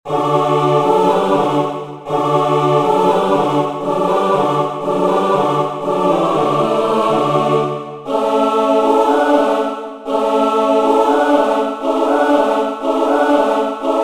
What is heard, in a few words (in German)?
Halleluja choeur; alleluia Haendel; Messe Soli Deo Gloria; Acclamation